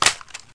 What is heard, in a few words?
cover down, weapons